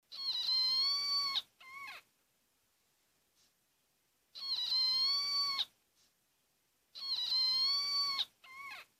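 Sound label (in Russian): красноухая черепаха писк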